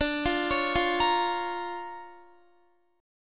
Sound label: D Minor Major 7th Sharp 5th, D, Guitar; Listen to DmM7#5 arpeggiated; JGuitar